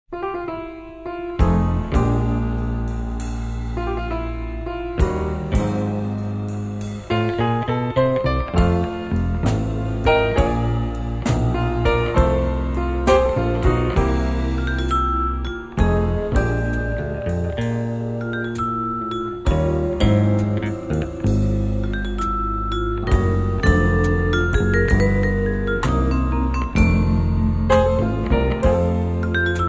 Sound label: Quartet